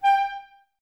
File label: clarinets, Wood Winds